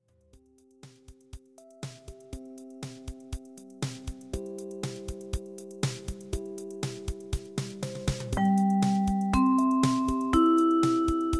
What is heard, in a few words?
Maj 2nd Vibes